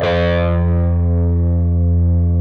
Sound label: PRS F#, Guitars, LEAD; Spectrasonics; Hans Zimmer Guitars VOL-1